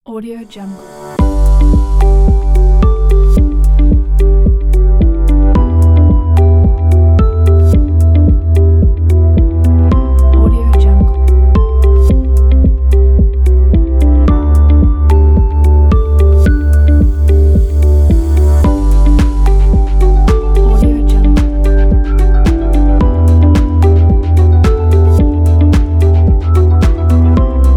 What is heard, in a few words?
downtempo corporate